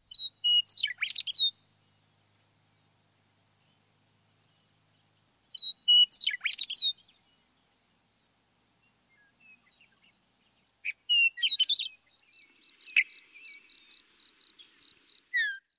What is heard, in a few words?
western meadowlark